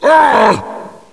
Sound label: Pain, zombie; cstrike; Nemesis